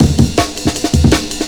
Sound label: Amen, breakcore